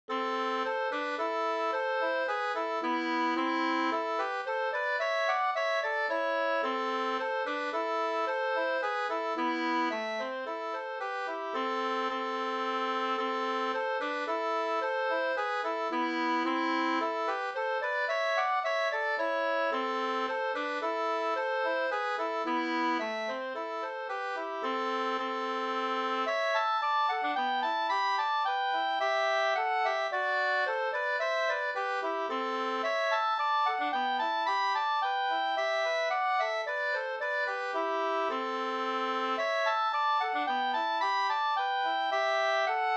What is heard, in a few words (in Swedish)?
polska valspolska; Annas valspolska e Ljuder Anders Ersson Mora 2st